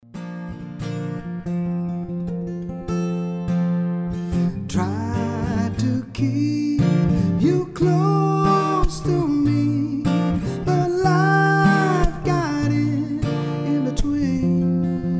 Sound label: Solo Acoustic; English/Jamaican solo acoustic musician